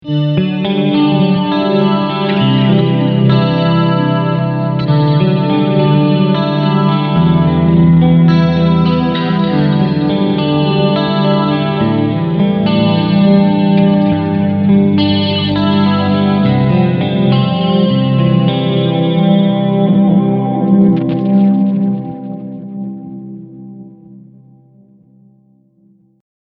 Slow Gear

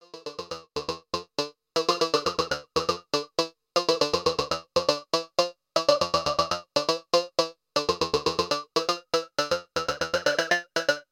Rossum Electro Music Morpheus Vowel Space

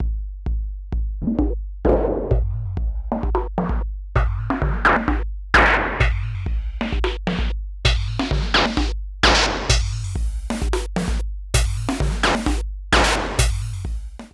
Drum Low-Pass; Erica Synths Sample

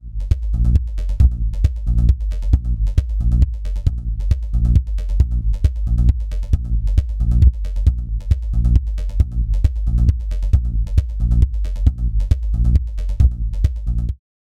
Elektron Analog Heat, Ducking Drive

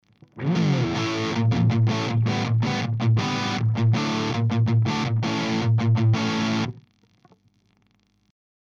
rhythm; SM57

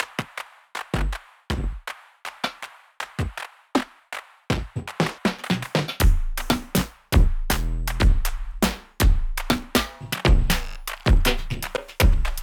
Break Drumbus Limiting

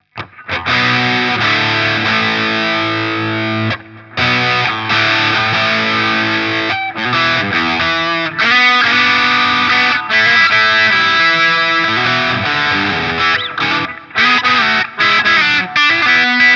DRV Gain 12h Steg; 1981 Inventions

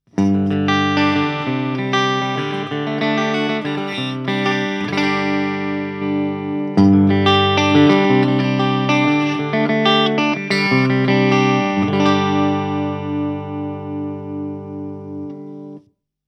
Solar Guitars E1.6AAN Clean

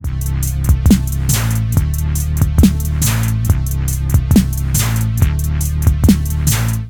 Roland SBX 1 Shuffle 50

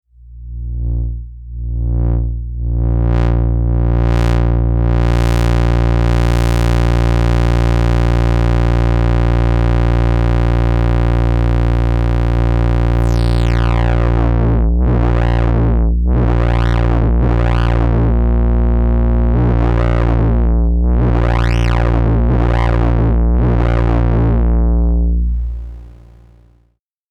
Synthesizers A1 Filter Test Dry

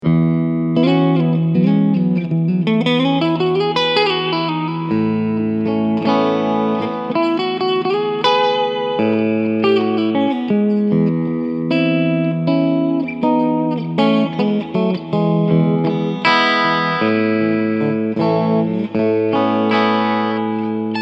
Cleansound

